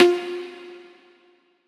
Riddim Synth Hit F Virus; One Shots; Ghosthack; Riddim Essentials 2020

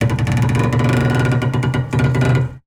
piano FX scrape; Cartoon - film effects

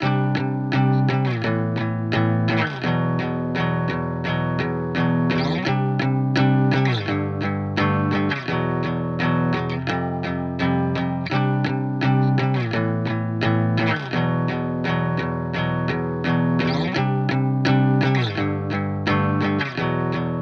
85 BPM C Min Main Guitar; Loop Stems; Cymatics; Miami Guitar Pack - Pt 2; Rust

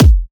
Kick - E; Drums; One Shots; Kicks; Cymatics; EDM Starter Pack

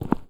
Footstep Stone Heavy

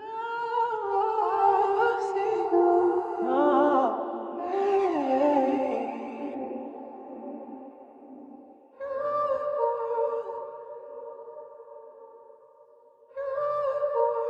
Vocal - 110 BPM G Min; Cymatics; Orchid Sample Pack; Vocal Loops

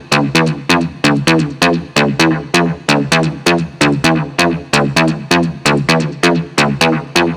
Synth Loop 130 D, Techno; Loops; Synths; GhostHack; Ghosthack - Free Techno Sounds 2024

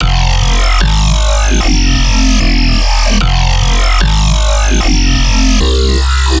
Bass Loop F Growl riff, Dubstep, 150bpm; Ghosthack; Dubstep Sound Arsenal

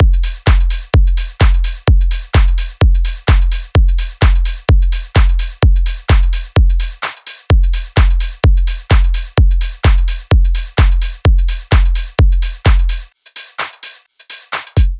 Drum Loop - 128 BPM, House; Full Drum Loops; Hammerhead; Cymatics

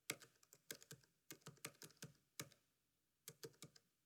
Laptop Keyboard Typing; Fairlight Sound Lib

